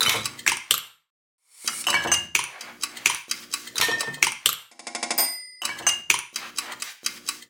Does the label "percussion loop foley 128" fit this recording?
Yes